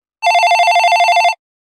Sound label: Classic Office, Business Rings